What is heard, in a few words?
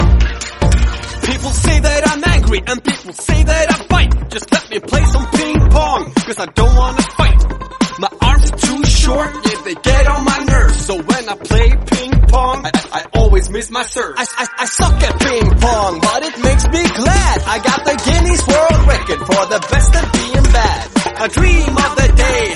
rap, ringtone